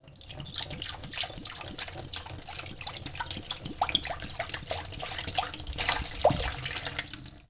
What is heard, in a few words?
Drain glass bottle